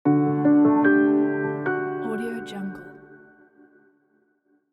piano logo intro

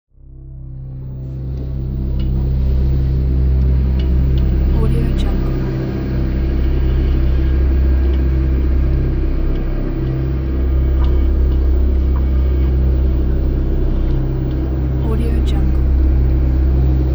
horror background ambience; phillipmariani

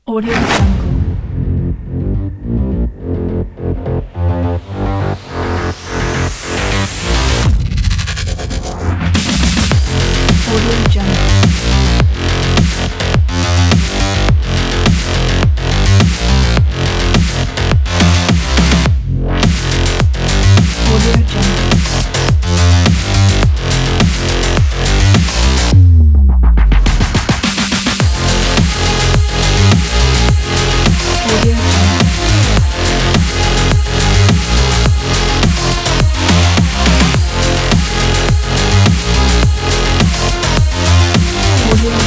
sport trailer kit

